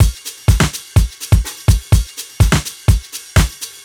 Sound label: nuhouse basic, 125 Beats